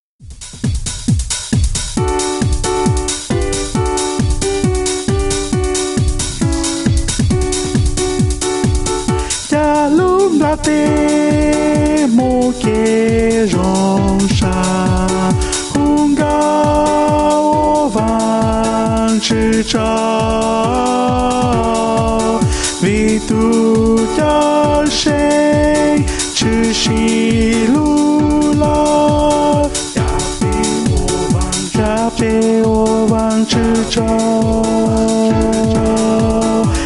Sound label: tenor